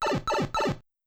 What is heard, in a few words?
shroom mega finish; tf2ware ultimate; mariokart